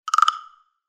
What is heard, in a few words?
Bamboo, ringtone; Samsung SGH-F310 Serenata